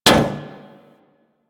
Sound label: Ship Hit Effects